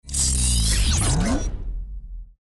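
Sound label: robots intro sound effects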